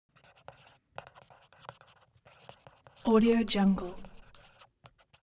object office marker pen write paper sequence

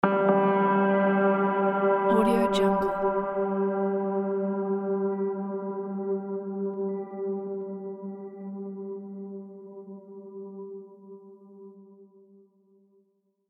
spacey piano impact

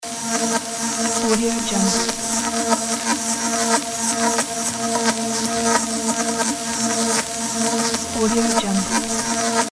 electricity arc

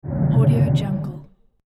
rolling

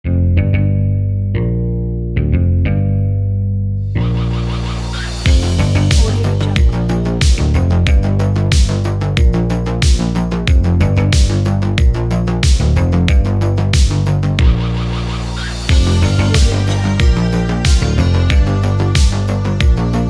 80s disco

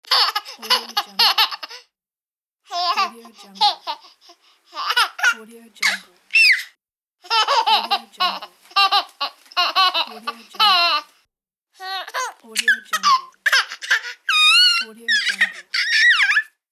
baby

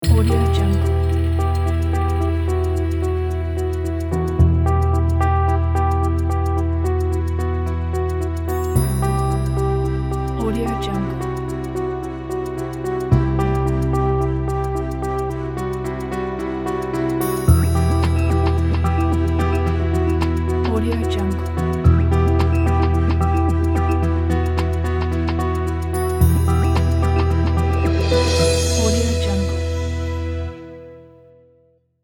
negative political ad

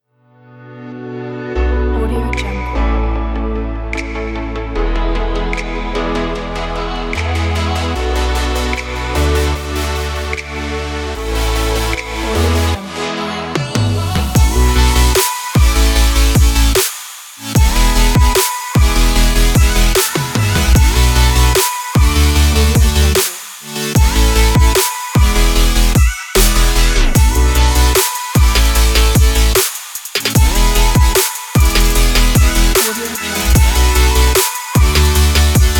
energetic future bass

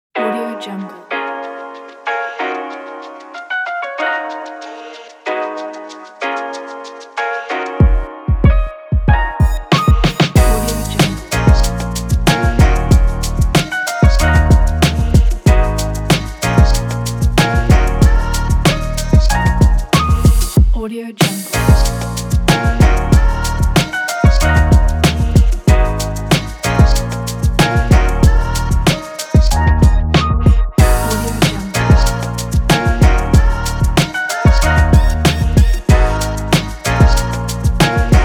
lofi pack

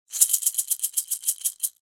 maracas long shake; sound ideas

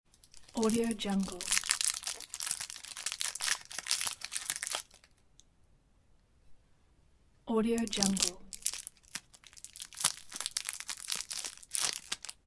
candy wrapper

